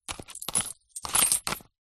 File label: purse pouch leather coins drop in onto notes; foley